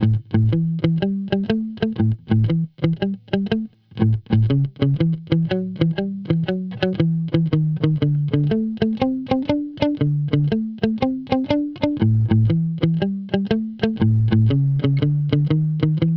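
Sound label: Blues Guitar; Digidesign; Pro Tools Essential Loops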